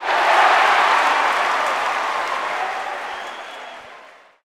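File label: Basketball Crowd Cheer; Crowds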